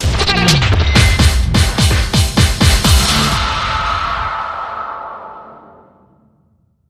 Psyched Rok Sting

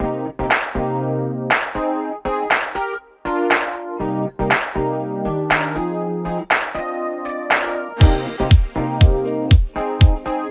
Harlem Disco Funk, Rhythm

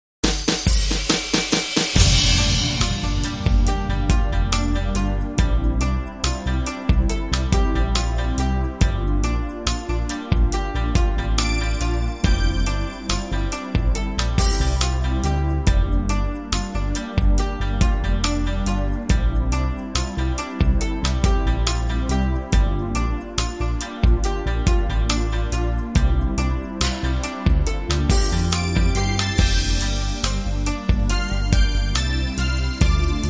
Japanese Ballad, Underscore

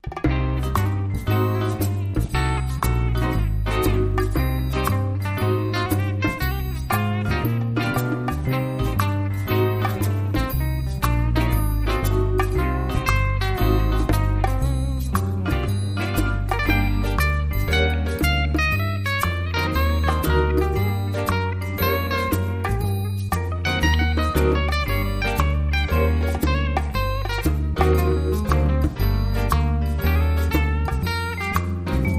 Guitar Solo Underscore

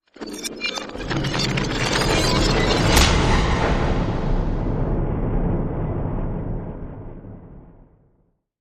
Reverse Rattle And Hard Impact Rumble Sweep

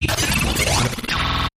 Phased Stutter

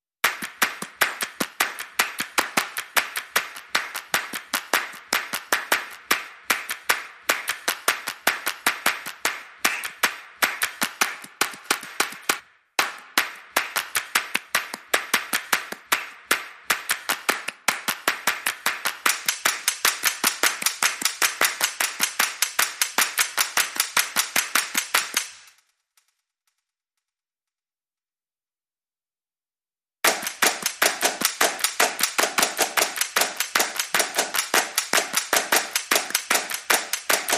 Clap In Time No Drums No SFX

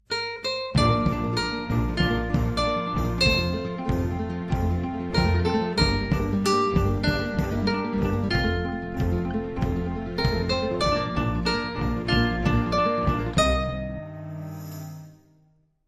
Cantina Sunset, Bridge

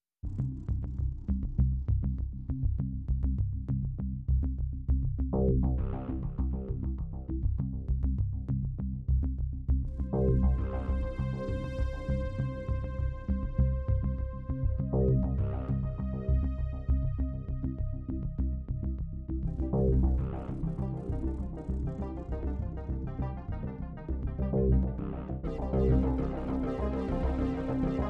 Pads and Pulses

